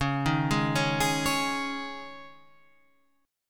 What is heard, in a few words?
C sharp Suspended 2nd, C sharp, Guitar, Half Step Down